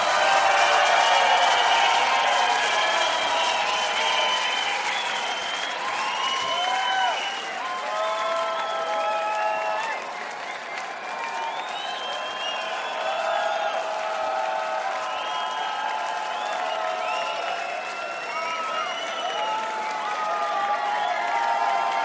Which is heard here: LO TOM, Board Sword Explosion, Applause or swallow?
Applause